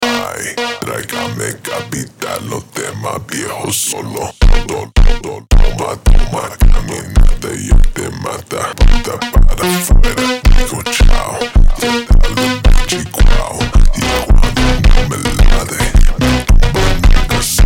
Super Slowed

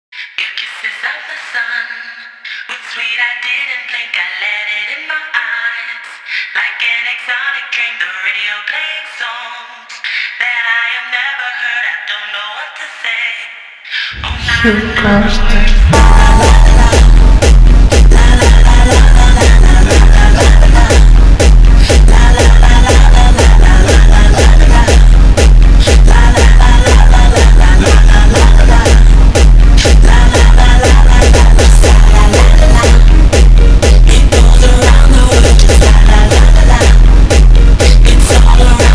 JUMPSTYLE slowed & reverb

